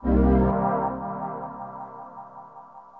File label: synth pad ambient 160 C; Sphere